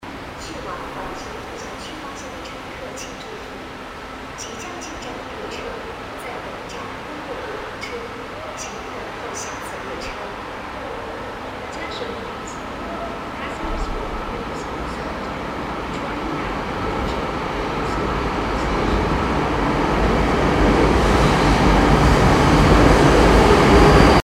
八通线 环球度假区方向 通过不停车

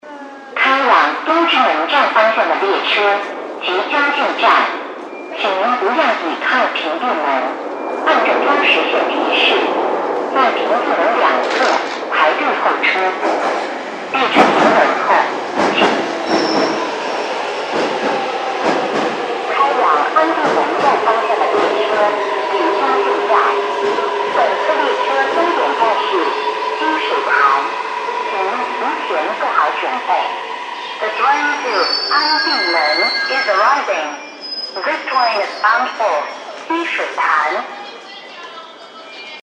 2号线-安定门方向积水潭终点

